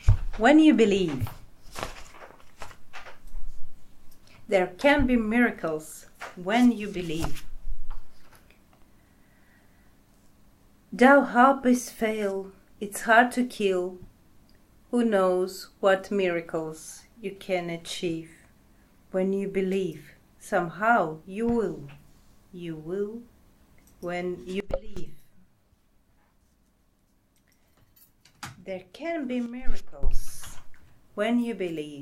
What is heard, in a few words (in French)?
chorale; Paroles anglais